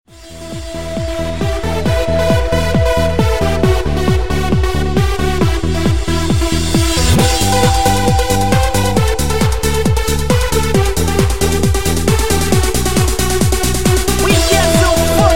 Indian Dance

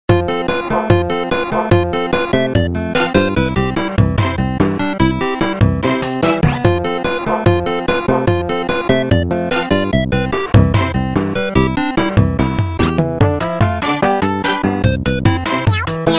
8 bit

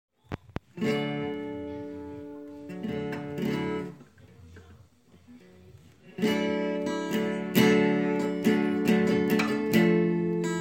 ACOUSTIC

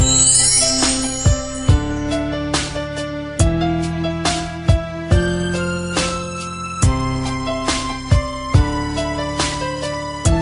Piano Heart Beat, ringtone

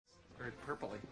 text tone

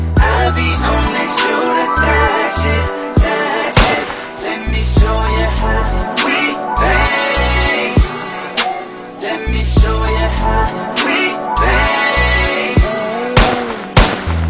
Rap

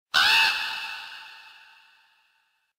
Crow; Sting

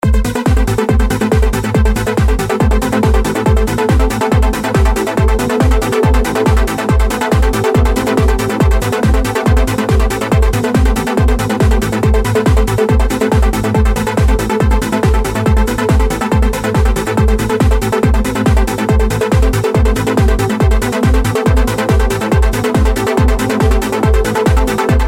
the best trance

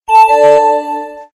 Alert Sound

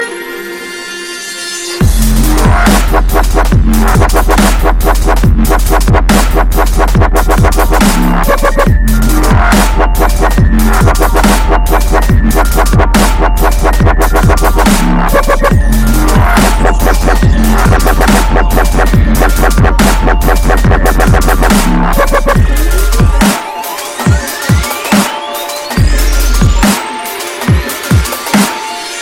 dubstep mix; ukf; hip hop

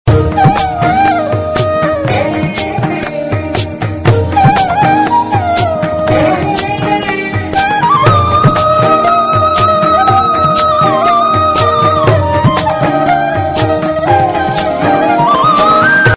melody

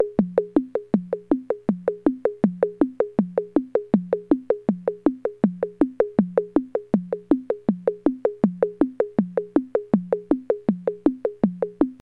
World Music

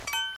confirm

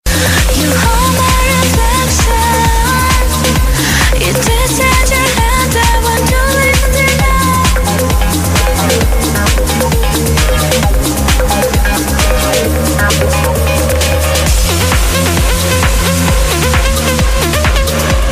Deep trance

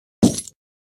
Currency Bag

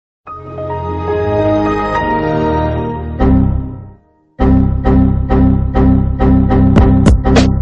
Skate Rock Win

